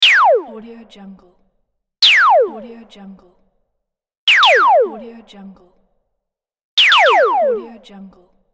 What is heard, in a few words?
laser gun